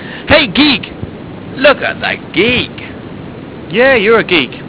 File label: Shot; Captain Correct